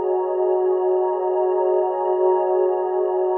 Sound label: NEVER CRY, Pads; Distored Reality 1&2